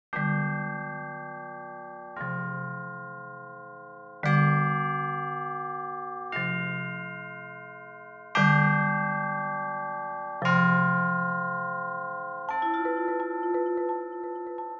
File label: Bells of Time midi; Relative Riffs